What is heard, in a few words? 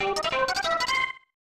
For Par Jingle